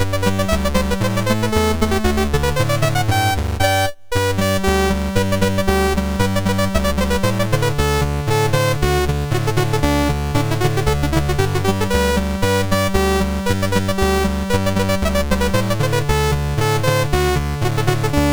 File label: Polka, sid MOS6581R4; Master Composer